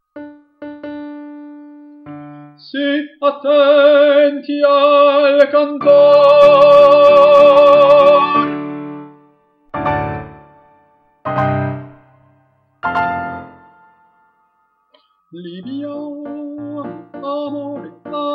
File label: basses; libiamo